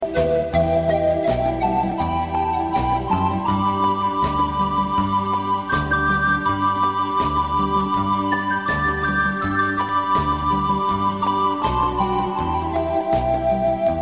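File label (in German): Panflöte, Klingelton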